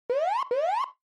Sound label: Warning Siren Low Short